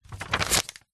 foley paper sheet a4 fold in half; zapsplat

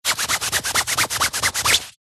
raincoat jacket itch scratch rub

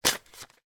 ripping open a pack of AAA batteries; foley

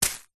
leisure hacky sack catch grasp hard

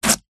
office sticky tape rip from cardboard

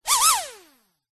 vehicles drone micro motor very short whir burst

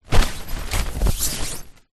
leisure tent movement fly sheet; zapsplat

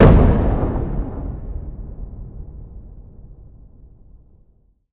Impact Boom Thud; bottlerocket

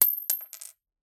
Coin Drop Concrete Floor

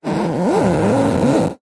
foley leather case thick rub squeak

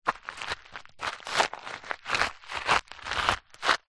footsteps movements scuffs gentle shoes gravel; foley

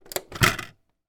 household cd player tray open

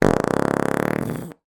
human fart; sound effects one

